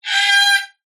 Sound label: animals birds cockatoo squawk